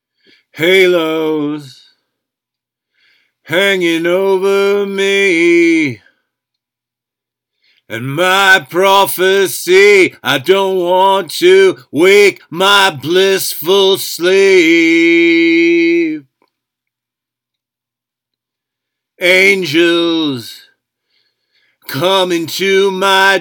Compressed Vocal

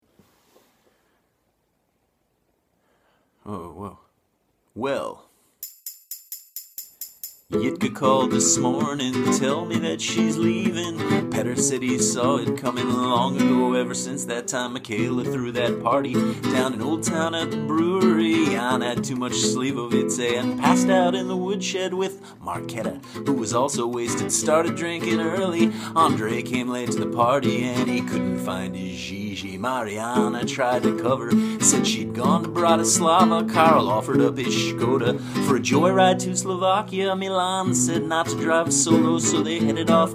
click intro; FAWM

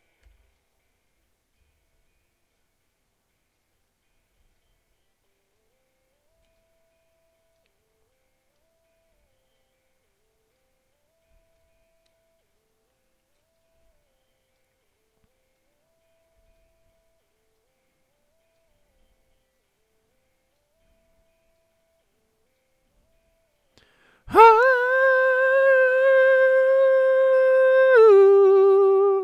carnival inst

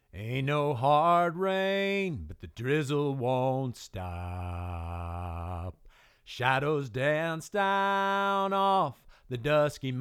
lead vocal

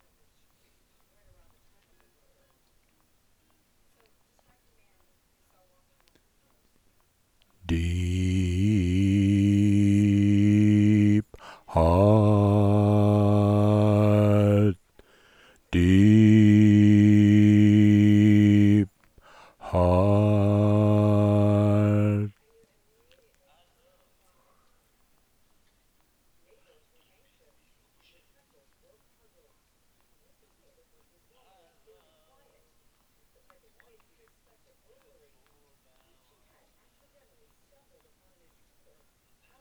Dance Vocal

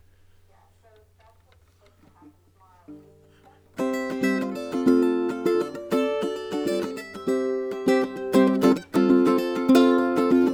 Echo Strum

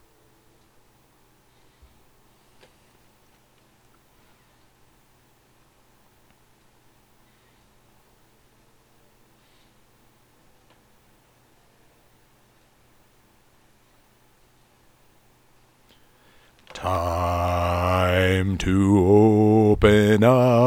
Classic Vocal

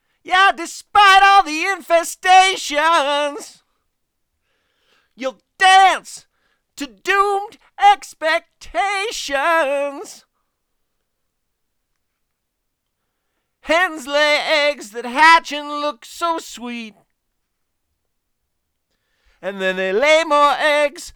Telephone Vocal

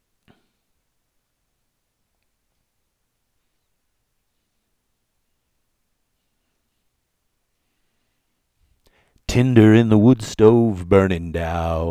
inst; dance remix

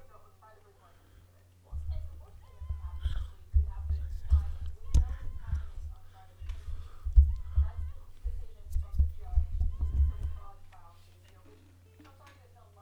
Dance Vocal

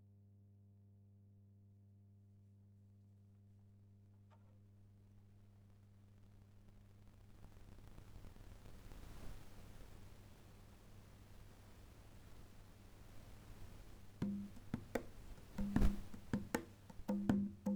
conga

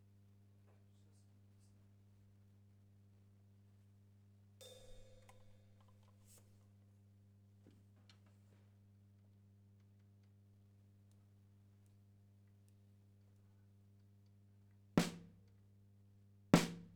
kick snare

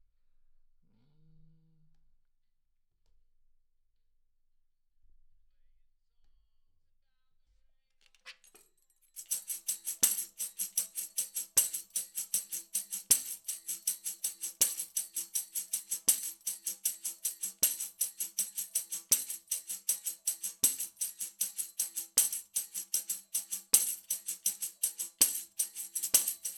tambourine

